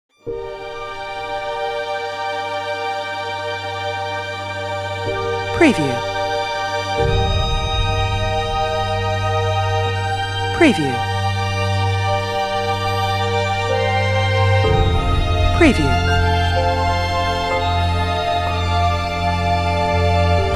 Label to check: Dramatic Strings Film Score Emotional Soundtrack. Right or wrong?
Right